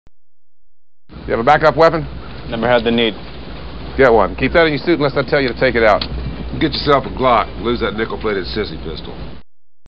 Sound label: glock